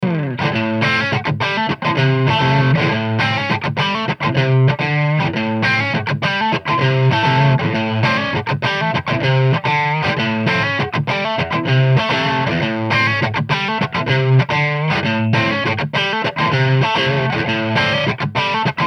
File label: Eminence V12 Crunch